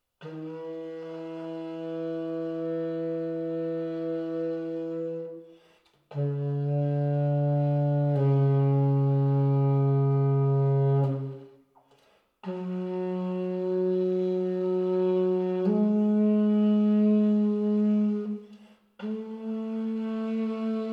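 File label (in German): ten, Alla tromba, Einzelne Töne gehalten; legato mit verschiedenen Tönen. Tenor; saxophon; spieltechniken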